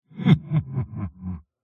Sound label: horror laugh male demonic wide heavily processed